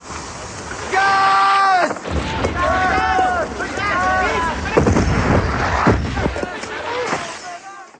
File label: Gas Alert